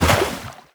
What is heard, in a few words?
tentacle hit; Crypt of the NecroDancer